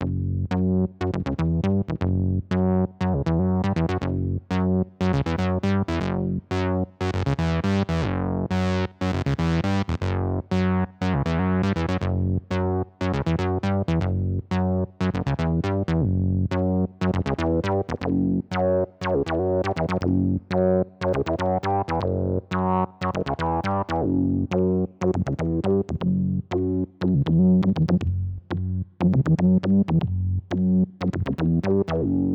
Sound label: bass lowpass evol, Clavia Nord Wave; synth basses; synth sounds